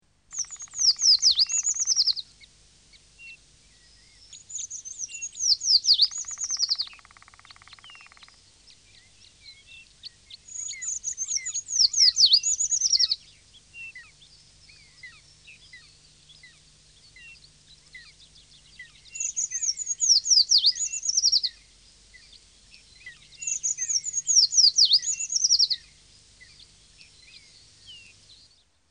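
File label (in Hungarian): Hegyi fakúsz; Madarak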